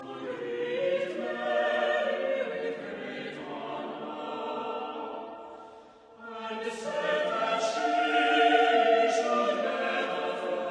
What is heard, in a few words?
carols